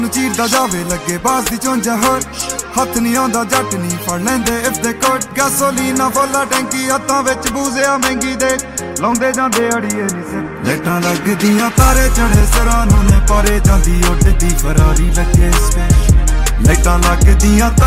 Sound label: SPACESHIP PUNJABI RINGTONE